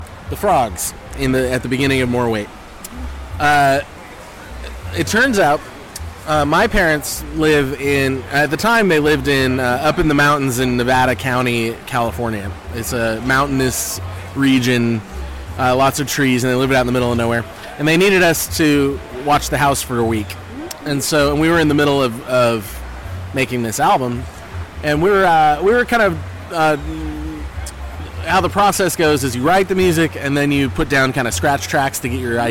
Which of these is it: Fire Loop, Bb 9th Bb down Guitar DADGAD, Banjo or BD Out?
Banjo